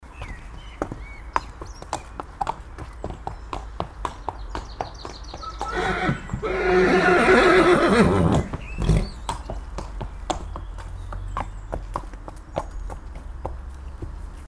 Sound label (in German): pferd